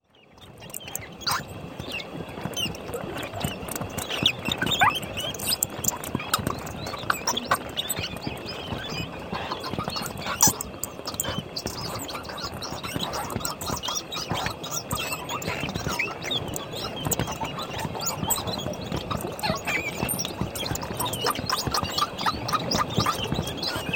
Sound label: Colony Of Bats